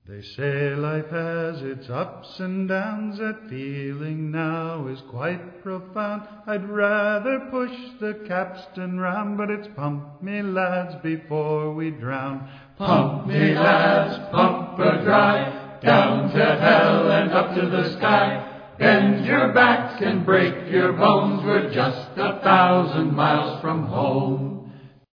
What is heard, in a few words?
pump